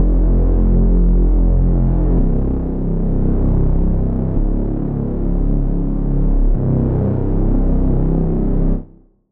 Reese, Sylenth1; Reese - Drug Cartel; Routed Sound; Sylenth1 Expansion - Noble Gangster Vol.1

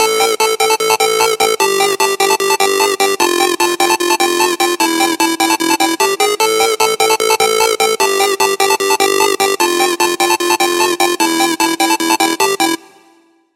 Lead; Digital Frog; Routed Sound; Serum 2 Expansion - Hyper Gamer Vol.1

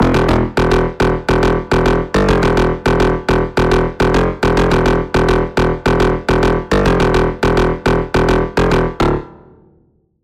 Pluck; Routed Sound; Spire Expansion - Chaos Bringer Vol.1

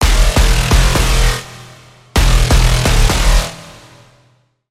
Braam, Serum 2; Braam - The Block; Routed Sound; Serum 2 Expansion - Quantum Enforcer Vol.1

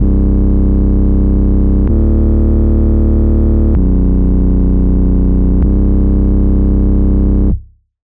Bass

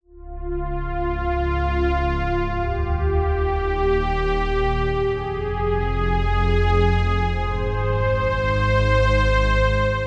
Pad, Sylenth1; Pad - Cosmic Emotion; Routed Sound; Sylenth1 Expansion - Star Scavenger Vol.1

